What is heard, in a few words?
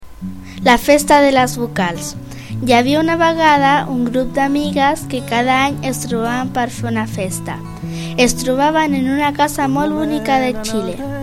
festa vocals